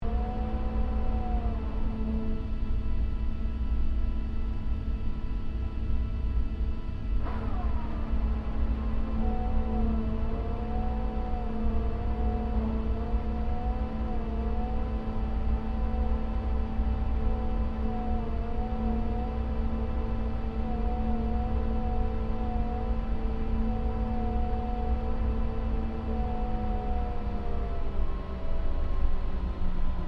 AIRCRAFT LOCKHEED L-1049 SUPER CONSELLATION TAXI INTERIOR; Connie, Super Constellation; 1955; Airplanes